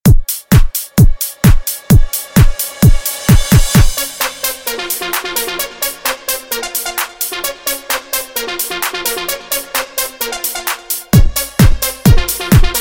130 bpm

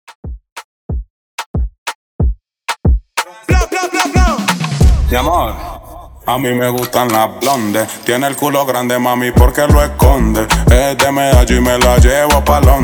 Intro Break Acapella 92Bpm; essntial tools

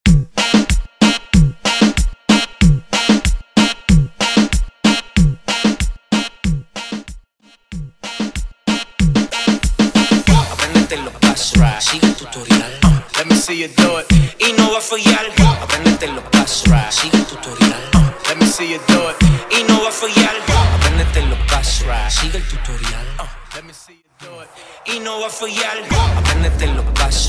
Reggaeton To Merengue 94 To 78 BPM; transition

